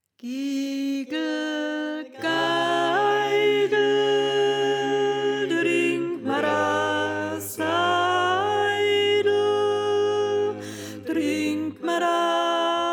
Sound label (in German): Sopran